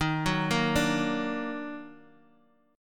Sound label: D sharp Minor Major 7th, Guitar DADGAD